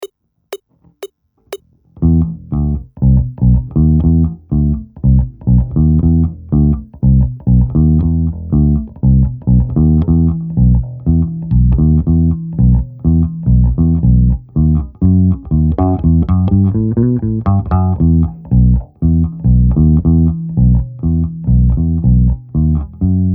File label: BASS PSHIK 120